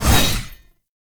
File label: Blade Swipe; Warframe; Dagath Blade And Whip